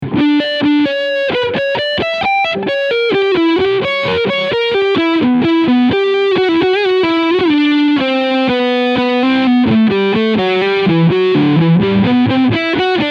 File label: PRS SE Leadsound Front Pickup; PRS SE Mark Holcomb